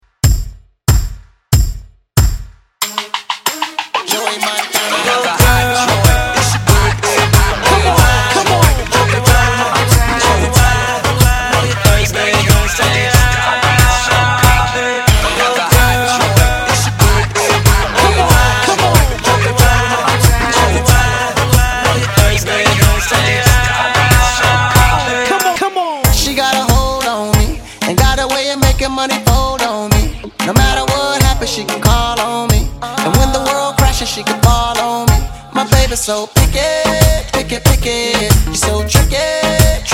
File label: Club Hype